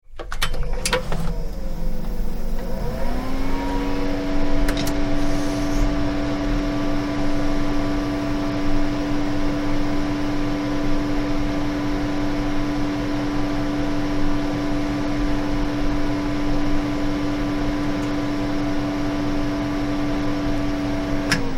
Computer Start Run Off, Turn on - running - turn off; Computers (Sound Effects:Electronics